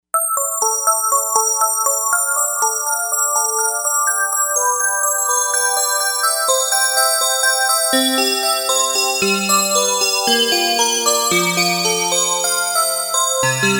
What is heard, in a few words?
Bell, Novation UltraNova; Factory Patches